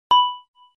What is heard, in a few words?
Video Record, ui; TCL; M2L